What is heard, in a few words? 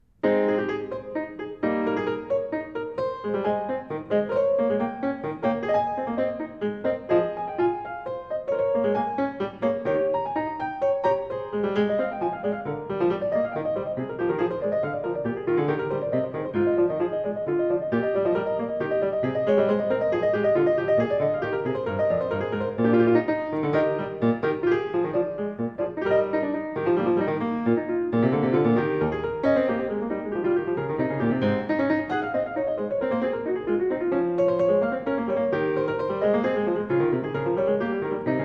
Prelude, clavicembalo; Prelude And Fugue No.17 In A-Flat Major BWV 862; Praeludium; Bach; Il clavicembalo ben temperato